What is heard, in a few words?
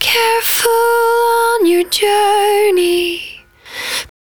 110bpm Ab, Velvet Vocal; Zero-G